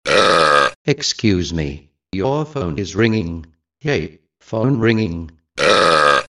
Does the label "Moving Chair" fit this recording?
No